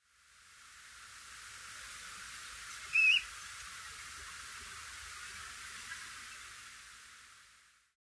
ringed plover nocmig

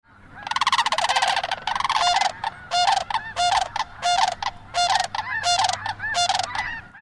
sandhill crane

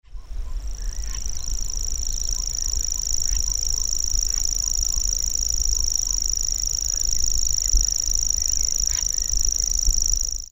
grasshopper warbler; Rainham Marshes, Essex, England; Territorial reeling song of a bird perched; 51º 29' 15.35'' N 00º 13' 22.7'' E

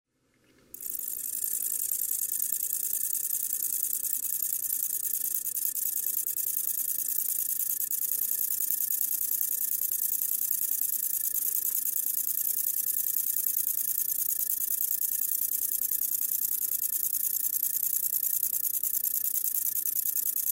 long winged conehead

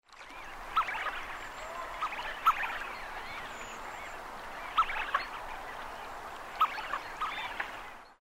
tufted duck

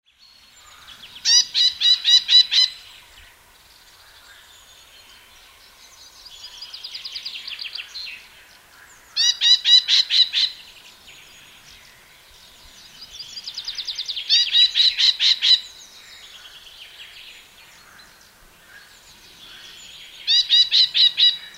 krupers nuthatch

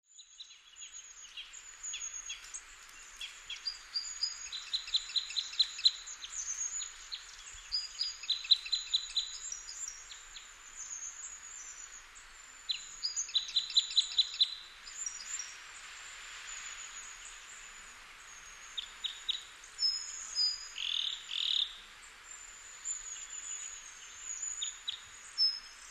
crossbill

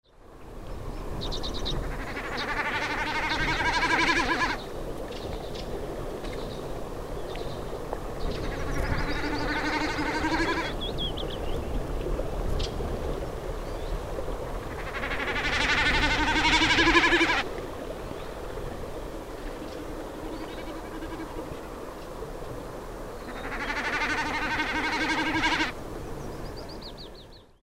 snipe

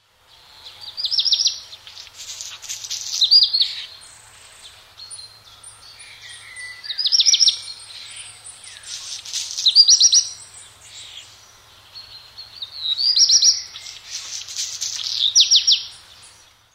black redstart

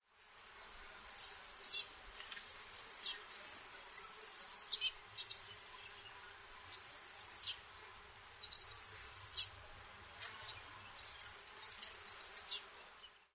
blue tit

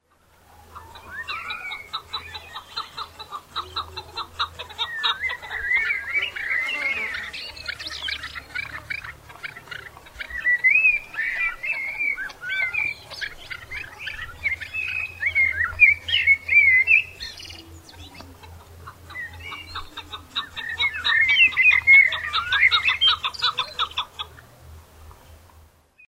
chukar

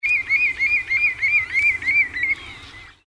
redshank

